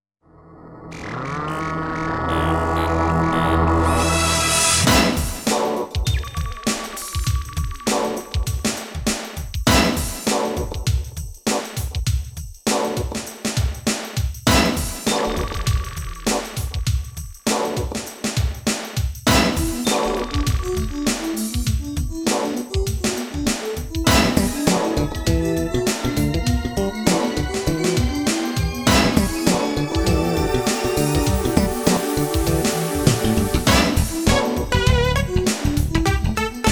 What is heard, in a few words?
groovy